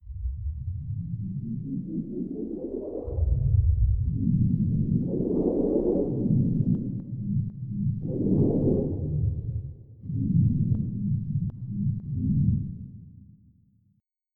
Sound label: Underwater; croc; AMBI WATR